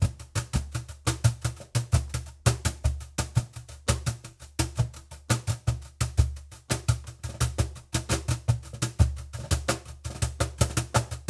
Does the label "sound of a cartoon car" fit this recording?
No